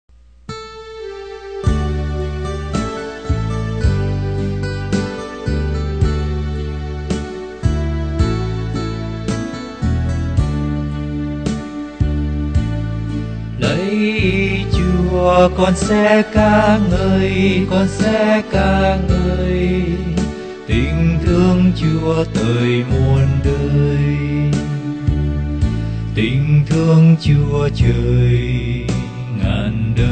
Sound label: BASS